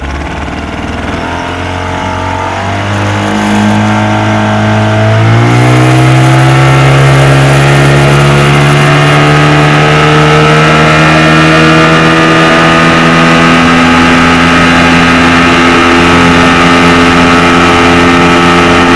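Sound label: boat; Mafie 1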